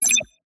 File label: select Menu New; spacemap